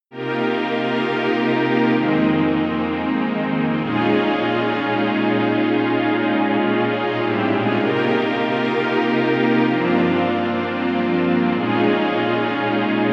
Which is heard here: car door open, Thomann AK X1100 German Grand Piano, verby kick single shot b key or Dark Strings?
Dark Strings